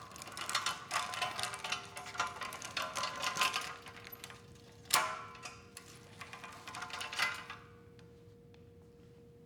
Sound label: 2 wheeler